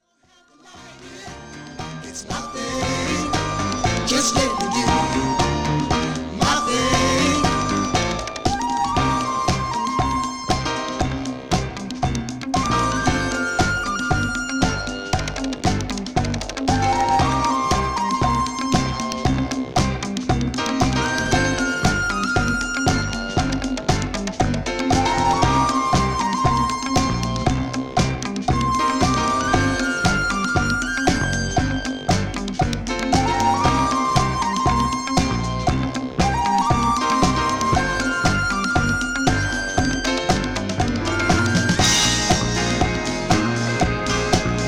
sine

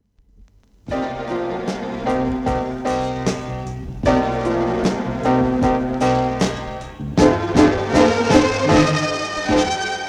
FUZZ

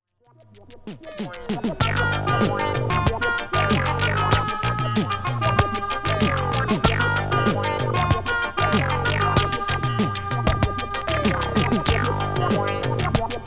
bamboo

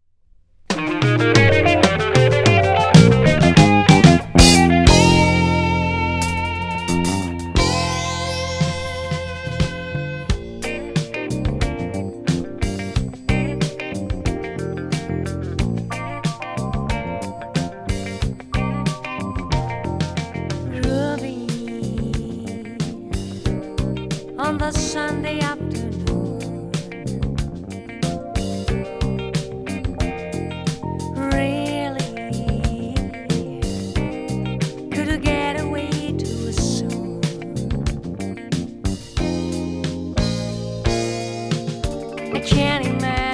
groove

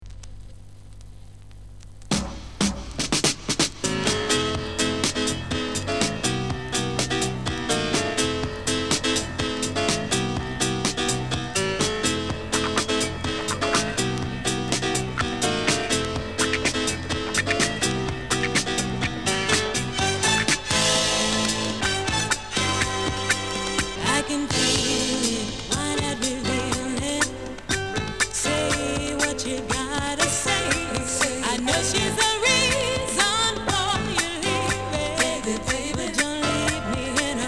GRASS

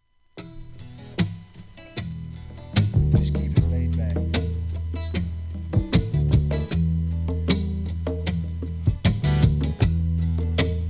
country

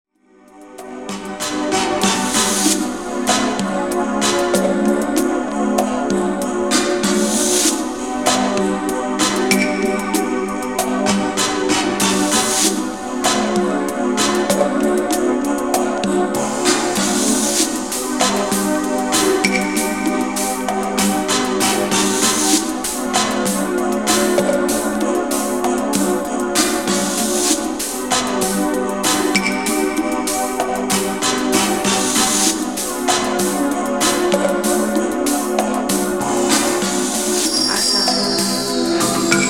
NOISE

